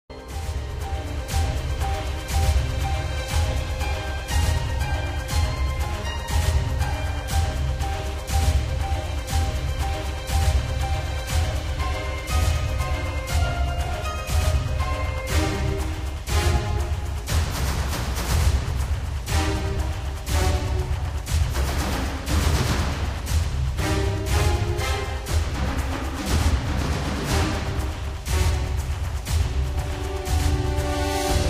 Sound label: Combat; Bristlewood Glade; free realms 2009 online; windows ps3